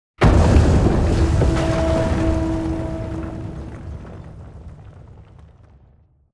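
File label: Explosion Rumble Exterior Distant Low Texture; Boomtown; Titanfall 2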